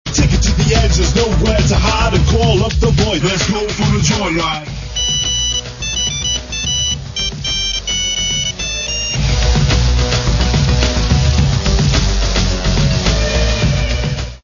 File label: ringtone; GTA3